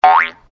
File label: jump